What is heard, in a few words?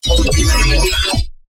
insane glitch; bass